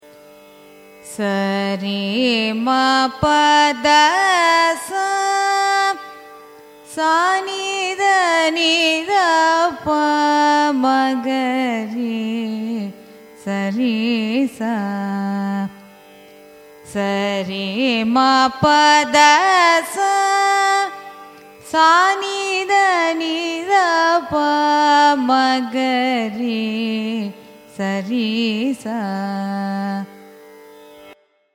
raga devagandhari arohanam avarohanam, carnatic music